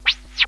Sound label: Sheet Metal